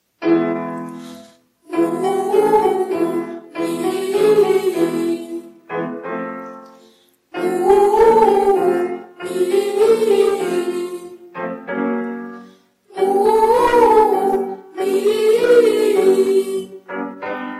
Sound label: Exercise Vocalization